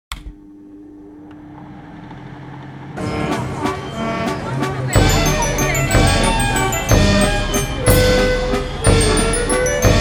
nostalgic fairground

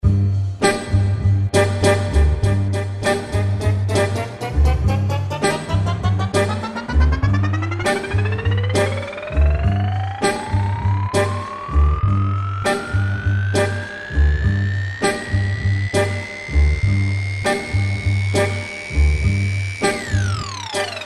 funk pitched

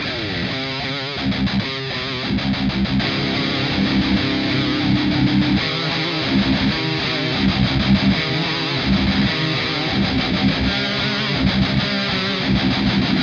attitude cab

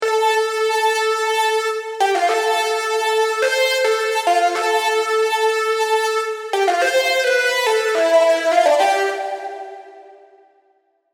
serum synths; chiqui audio

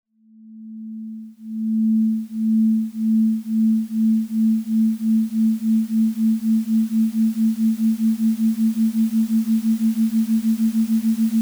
binaural beat example

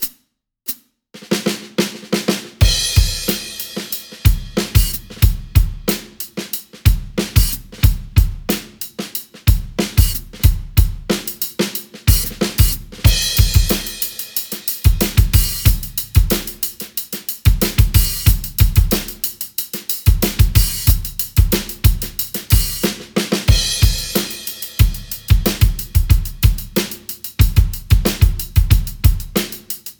variety pack funk

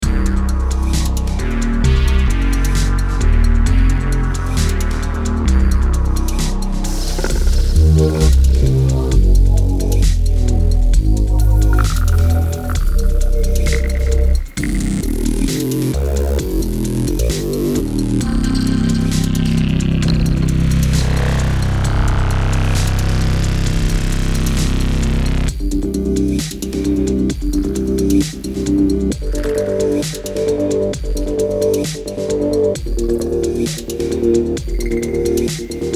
dark waves

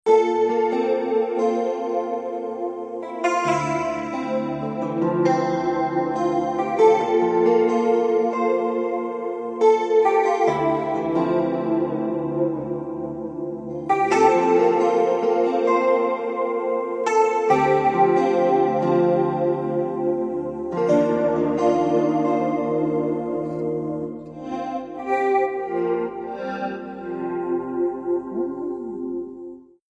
fret solo; fret affair

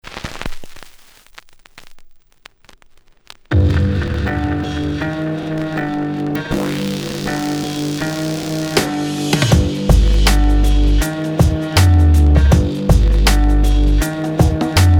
vinyl; vinyl revolution

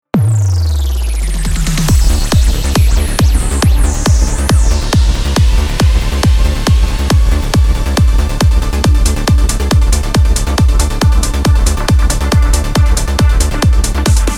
edm; ujam

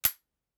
lever action rifle dry fire

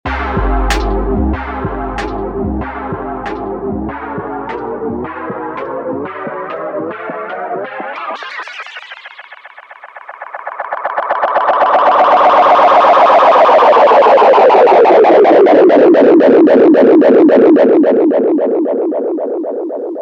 saturated swell

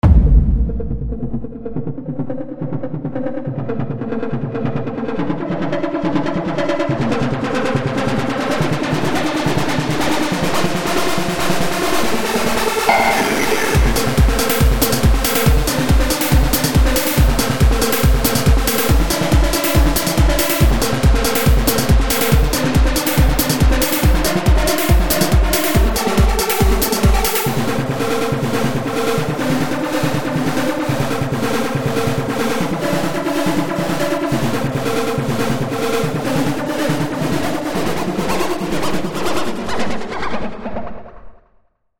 trance multilayer

